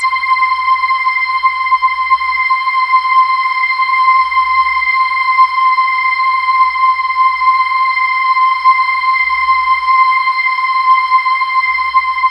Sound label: VOX ORGAN; MORPHEUS; Syntec; Wall of Sounds